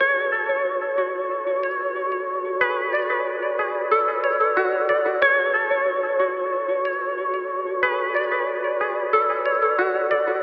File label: guitar melody